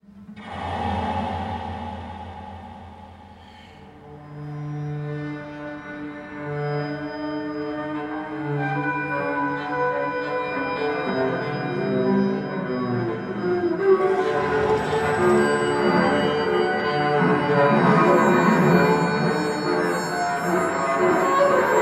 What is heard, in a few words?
cello computer